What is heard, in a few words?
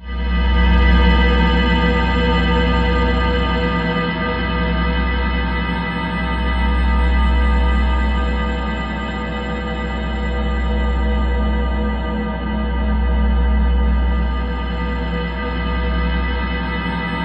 SPACE, Ominous Drones, SYNTH SND; USB Soundscan vol.48; AKAI